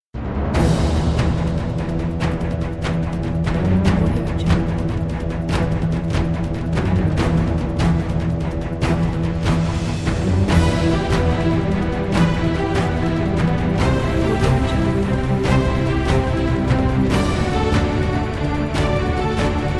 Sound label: epic sport intro